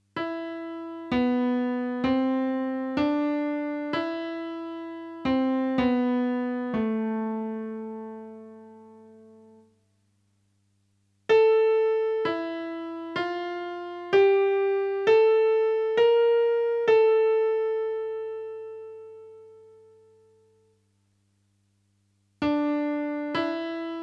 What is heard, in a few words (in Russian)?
minus na pianino v medlennom tempe